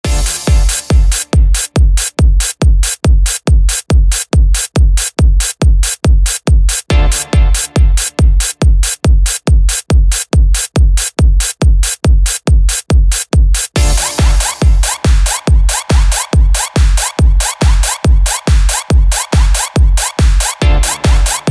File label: RIMSHOT DUB MIX